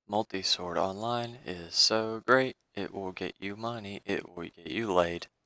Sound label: Jingle